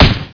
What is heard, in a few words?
aug, weapons